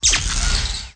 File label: ZAP; The Interactive Alphabet